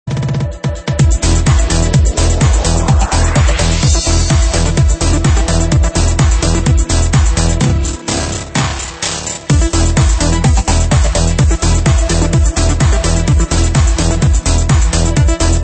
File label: Synth Sample